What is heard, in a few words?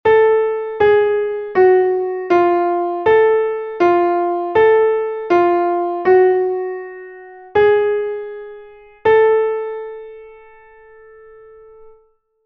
the diminished fourth; Essentials of Music Theory: Elementary